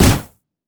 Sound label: turret; LoL; heimerdinger